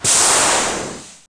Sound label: Fire Steam